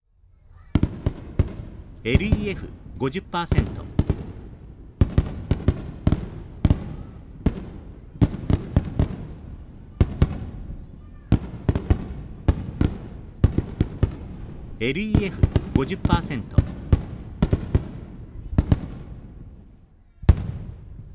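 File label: fireworks; hanabi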